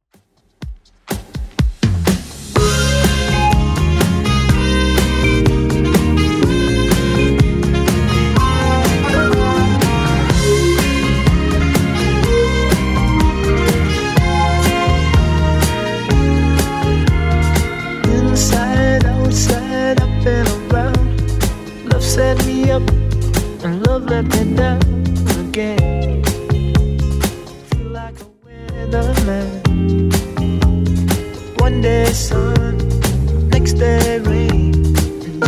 70s Redrum 124bpm

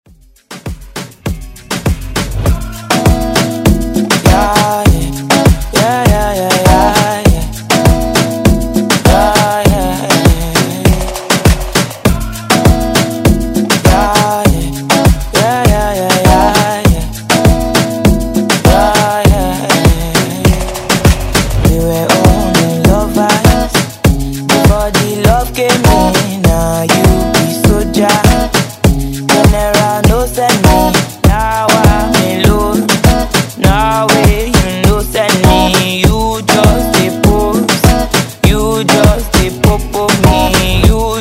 Moombahton Afro Redrum

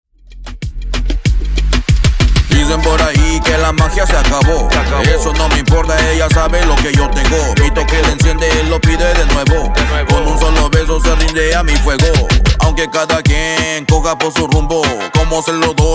Dj Intro Outro 95bpm

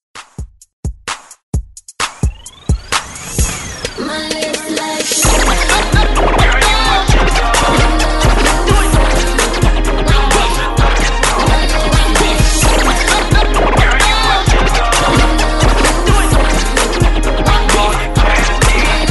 Scratch Re Drum 130 Bpm; DJDOLLS

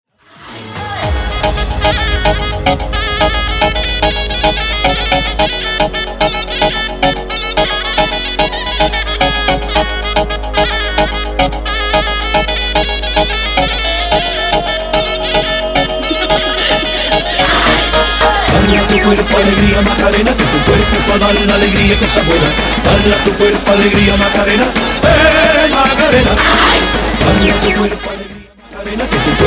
Moombahton 110bpm

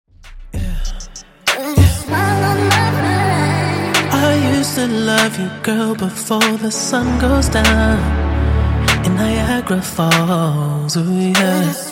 Intro Outro 97 Bpm

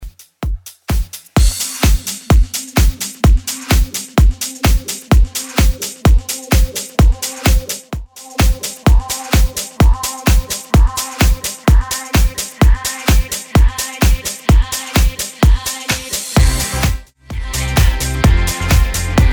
90s Dancemix 128bpm

